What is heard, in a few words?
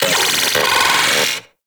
Arachnoid Wraith Vocal Pain; Warframe; Corpus